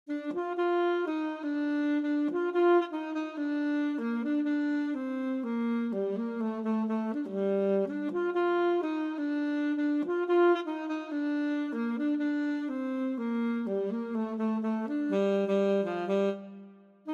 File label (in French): Saxophone alto; Les Mysterieurses Cites d'or